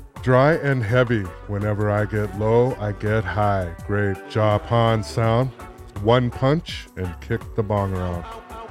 kick bong

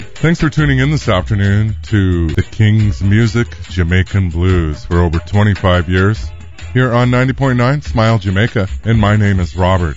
jamaican blues, melodica

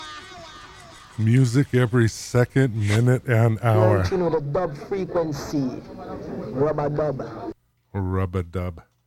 dub freq

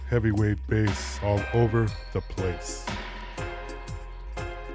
heavy bass

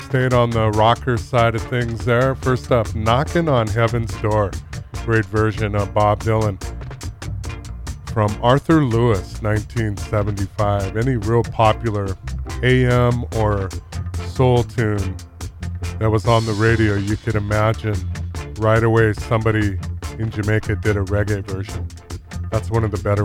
reggae covers

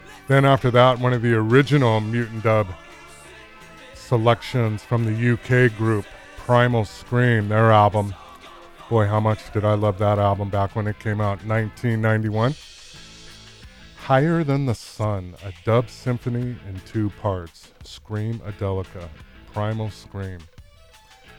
primal scream

